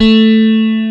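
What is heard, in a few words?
A3 Telecaster, guitar; AKAI S-Series CD-ROM Sound Library VOL-8; SET#1 GUITAR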